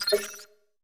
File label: order placed